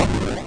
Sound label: EXPLODE; Diamond Hollow 2